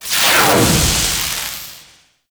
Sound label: Powerplant Jettison Sparks; Gameplay; Warframe; Corpus Railjack; Tether Power Plant; Ambience